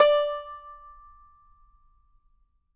banjo D5, banjo notes; Sampleheads - Whole Lotta Country 1 & 2

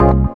synth chord, dance synth; Sound & Vision - Gigapack I CD 2 (Roland)

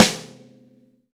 V-Pop Snare, Roland BK-5; Snares

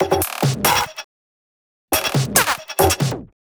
Loops 140 BPM Hihat Percussion Loops

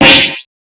Hats, Alesis Performance Pad

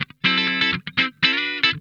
MISC RARE, Funk Guitar; Zero G - Funk Guitar

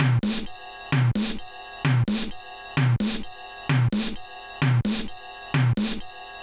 kawai k1 OMNIBUS LOOP; wavetables

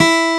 Acoustic Gtr E3; Proteus 2000; Digital Sound Factory Proteus 2000

